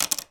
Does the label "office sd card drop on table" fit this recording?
Yes